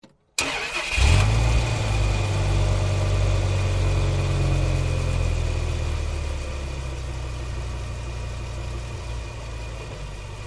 Dodge Ram: On Board Start, Medium Driving And Off, With Big Revs. Mic Tail Pipe And Engine.